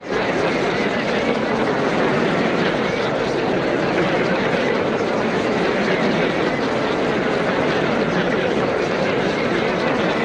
ambient buzz

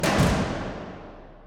Tymp

